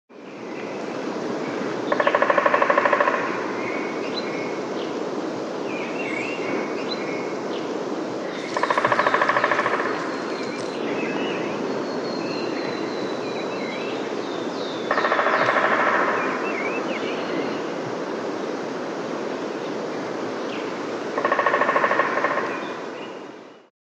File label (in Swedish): tretaig hackspett, fagelljud